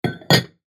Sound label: Glass mug placing on shelf; utensil